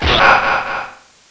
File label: death; Alien Arena; firebrand